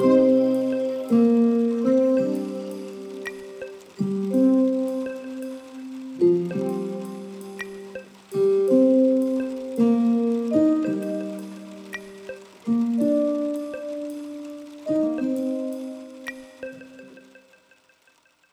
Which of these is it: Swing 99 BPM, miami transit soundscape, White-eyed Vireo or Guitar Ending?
Guitar Ending